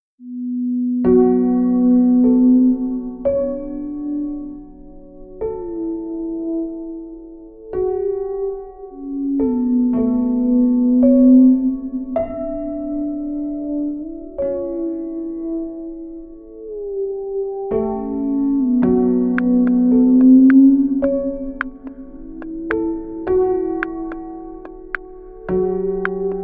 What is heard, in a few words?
Glass Piano; Tender